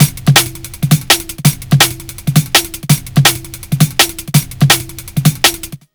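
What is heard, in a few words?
jungle loop, 166 bpm; Explosive Jungle; USB Soundscan vol.05; AKAI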